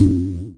throaty boing; Weird and Interesting Hits; single hits

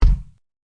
Kick Hard Rock, DRUMS, REAL LIVE KITS; Osama Qureshi DrumKit

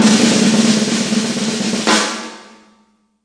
ambient roll, snares; single hits

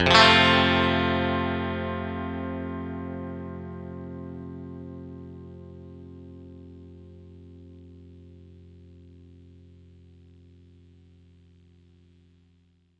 clean chord F, clean electric guitar; clean electric guitar chords

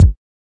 kick

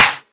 CLAP, Volca; WallsVado Volca Sample Pack

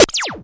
laser powered spud gun, electro FX

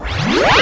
Electro FX; Ueb HE Electro FX

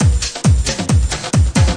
135 bpm gated house, drum loop; 131 to 140 bpm; samples-ghost

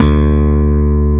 slap E2, Hard Slap Bass

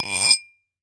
Mug Scrape; ModeAudio Found Percussion Hits

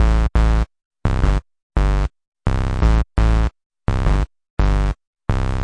offbeat bass, BASS LOOPS; MELODIC LOOPS

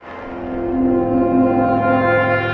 fifth synth crecendo

